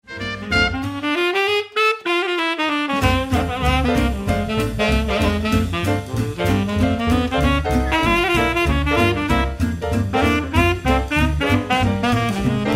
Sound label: sax